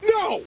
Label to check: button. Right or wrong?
Right